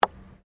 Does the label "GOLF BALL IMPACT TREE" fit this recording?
Yes